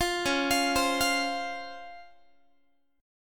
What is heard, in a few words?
C sharp Major 7th C sharp Guitar; C#M7; arpeggiated